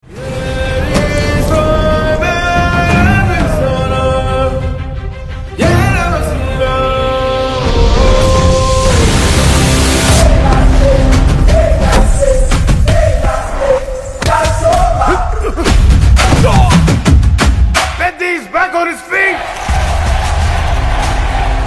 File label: Trailer BGM Ringtone; Peddi